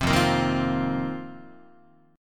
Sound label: F 7th Suspended 4th F down Guitar; strummed